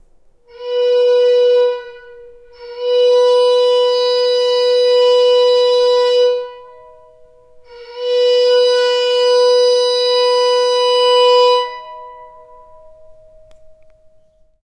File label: violin screatch untuned; church